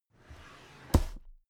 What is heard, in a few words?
drawer cabinet carton open regular